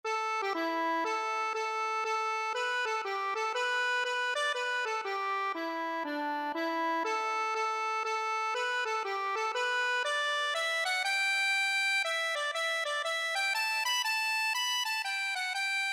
accordion

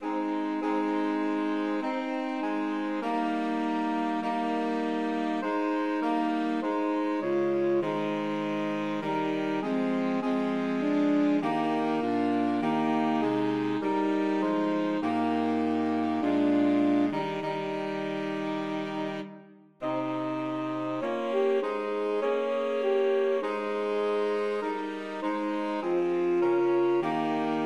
o come SAX

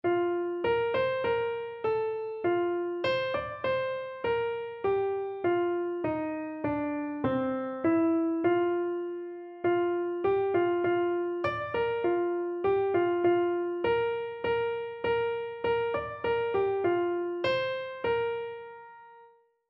there is a wideness, Keyboard

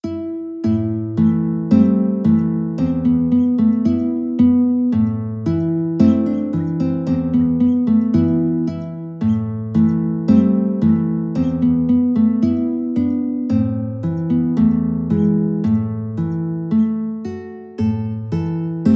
guitar duet

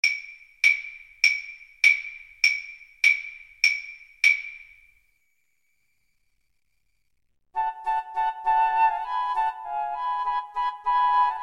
flute trio

